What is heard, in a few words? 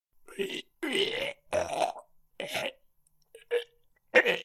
Clown House Man Sounds